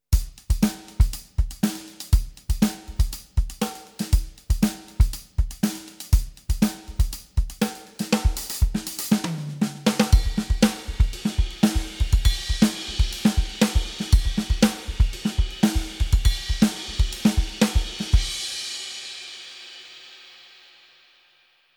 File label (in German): Drums mit MC DIM normalisiert